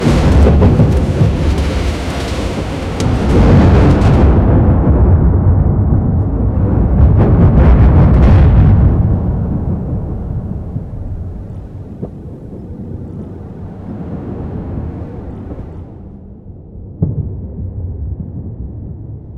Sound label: orchestral swell electric, thunder